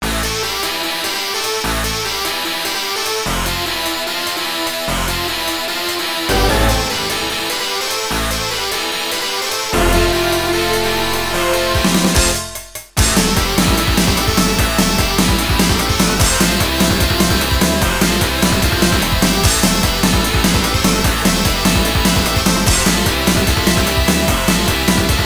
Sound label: Heavy Metal Bomber, Stage 3 BGM; arcade